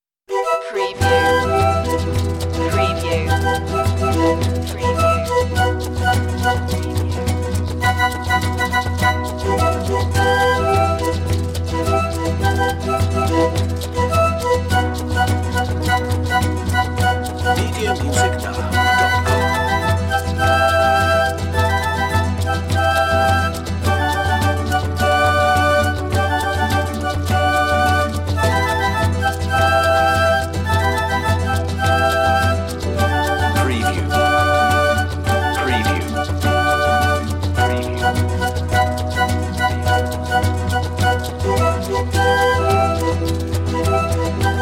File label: peruvian song